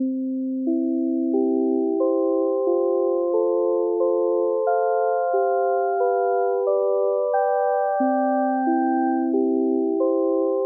key over time

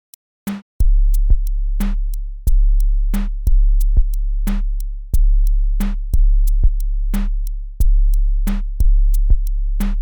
groove reversed

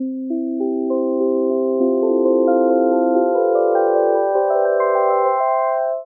one clip melody

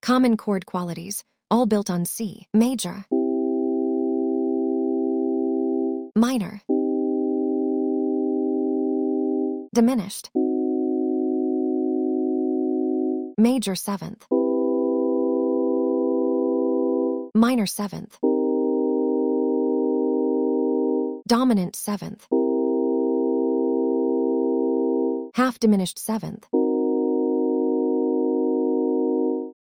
chords tour

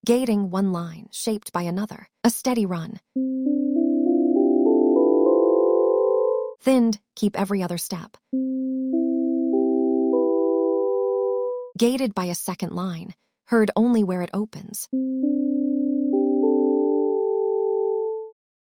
gating

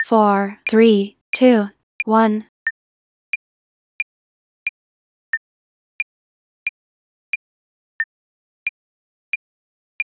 click track

